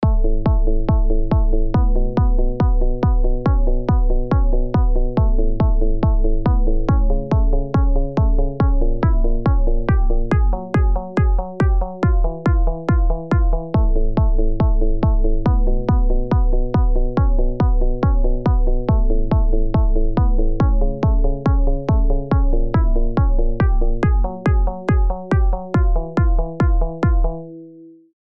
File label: Synth song suckey